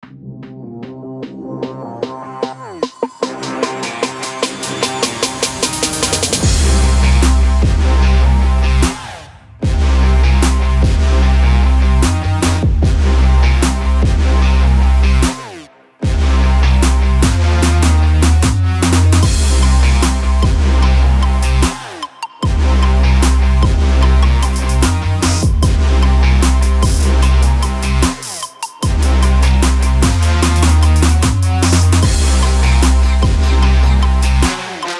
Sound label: trap beat